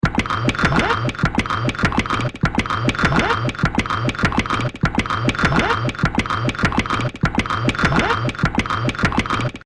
Strange Magic Machine Loop, Royalty Free Looping Sound Effect